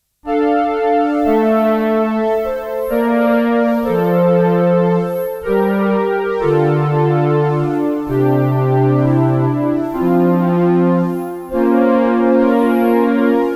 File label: Emax OB 8 Fluty